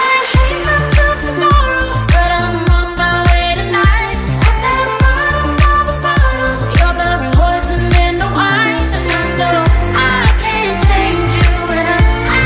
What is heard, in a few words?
Music Ringtone